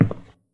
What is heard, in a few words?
footstep; Ludum Dare 46; Random footsteps